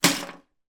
office large pencil case full of pens down on table